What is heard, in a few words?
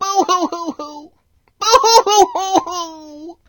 cry